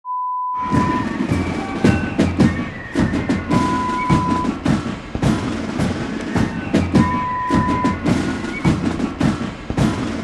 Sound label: Marching Band sound